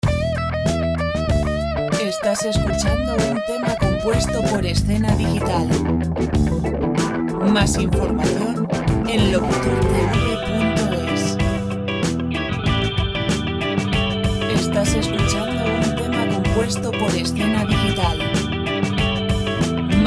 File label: rock; Música de rock sin copyright